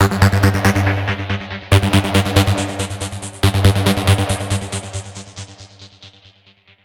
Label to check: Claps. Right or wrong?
Wrong